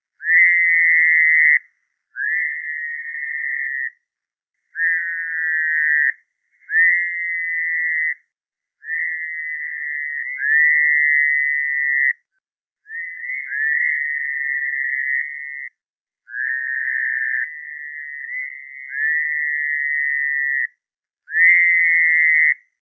Fowler's Toad

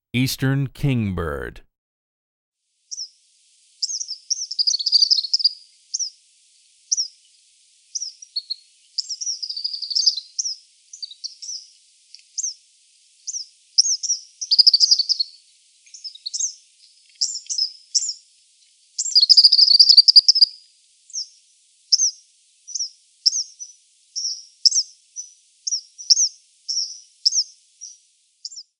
Eastern Kingbird; Non-Game Birds

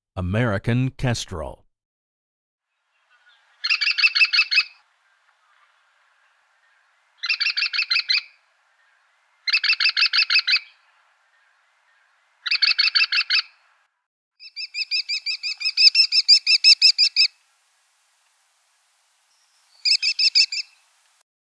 American Kestrel; Male

